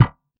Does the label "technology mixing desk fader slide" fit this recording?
No